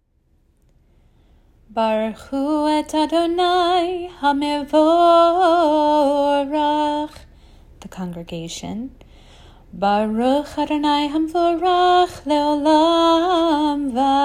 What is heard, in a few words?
Barchu chanted with cues